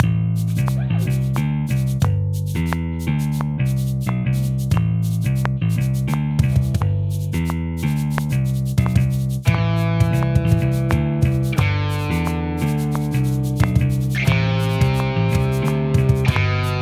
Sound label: 7 8 bass idea